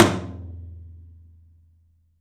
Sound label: open tom; 3D Sound; 3D Drums; 13 TOM